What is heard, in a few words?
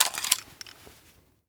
remove clip; thompson; Rust